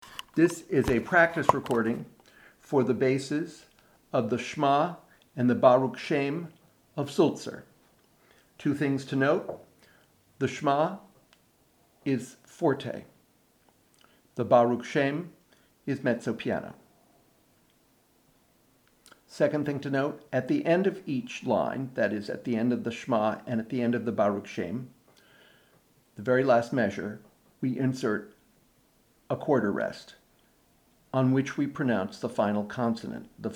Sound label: Shma and Baruch Shem, bass, choir; Sulzer